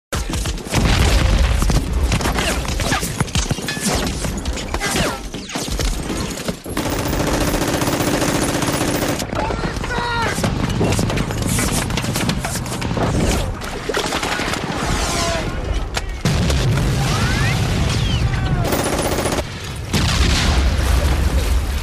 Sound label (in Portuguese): tiros em guerra